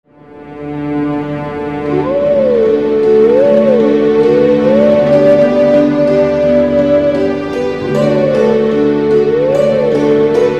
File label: wolf song; twilight princess